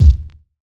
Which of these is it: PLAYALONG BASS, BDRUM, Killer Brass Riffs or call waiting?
BDRUM